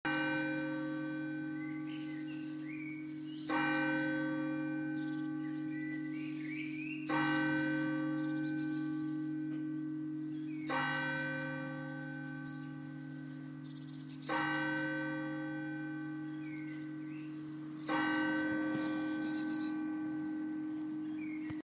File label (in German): Stundenschlag